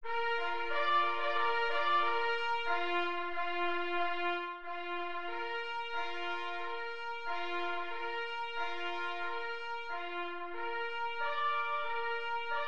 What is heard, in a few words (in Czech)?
svycarska polka hudba